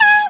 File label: pluck